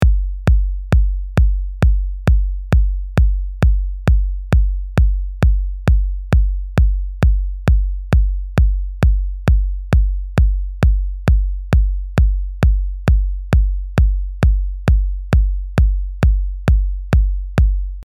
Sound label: Groove